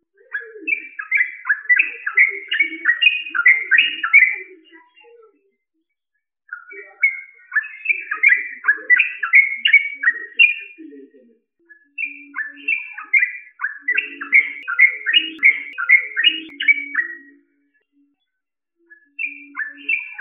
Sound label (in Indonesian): trucukan ropel